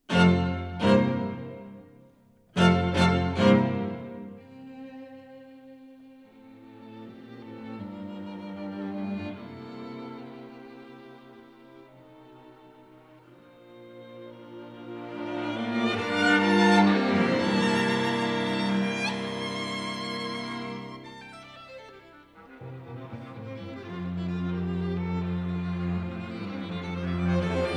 String Quartet in F major; No. 2 EG 117 - Sostenuto - Allegro vivace e grazioso

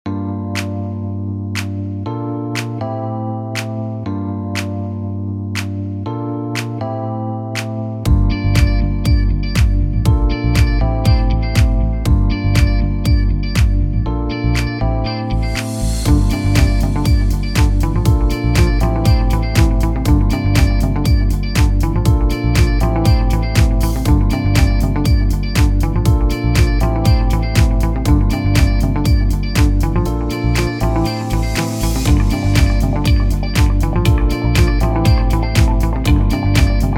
Chilled Ambient

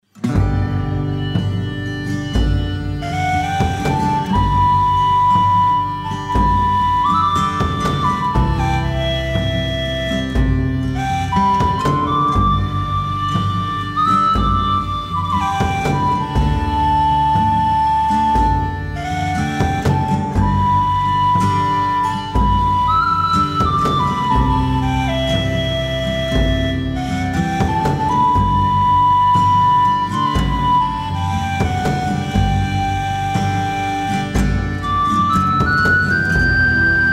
Danny Boy version 2 (instrumental)

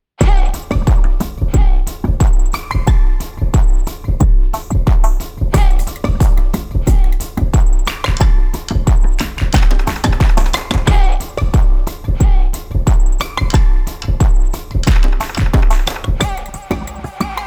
Bike Ride

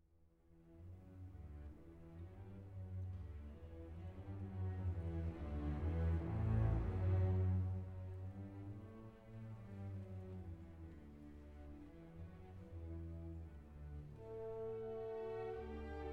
Bassoon; Beethoven 9; Vienna; Rattle

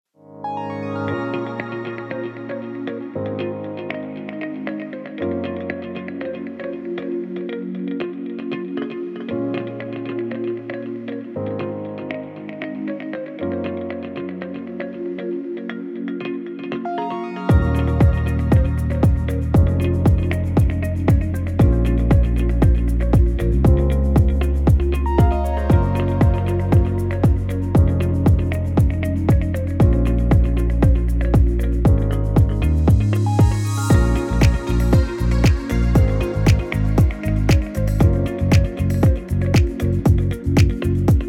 Corporate

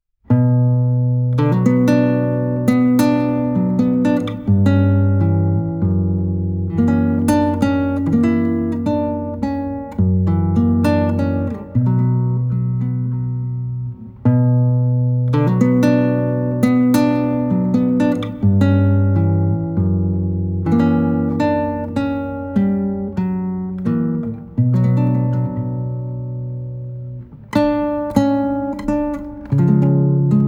Guitar

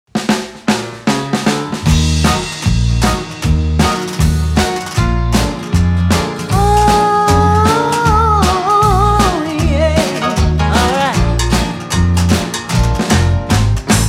Blues

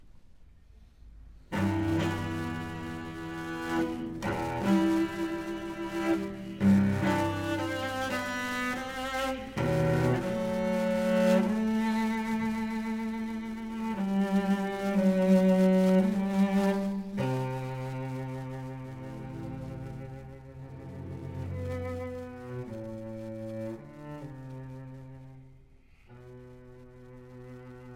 Cello Concerto in e minor

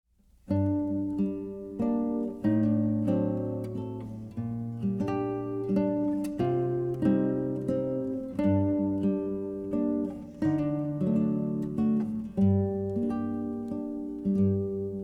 Waltz

